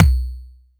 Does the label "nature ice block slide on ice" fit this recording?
No